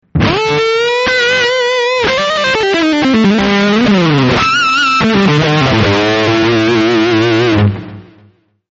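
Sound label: heavy high gain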